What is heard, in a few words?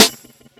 snare; hl2mp; mpc